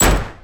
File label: gunshot